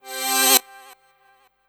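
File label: synth, drum & bass, 165 BPM; Ueberschall; Drum & Bass Resonance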